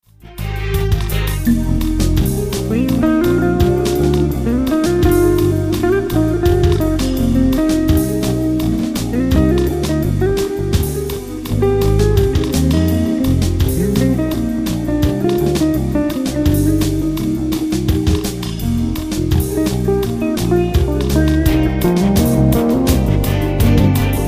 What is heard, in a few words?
Film Reggae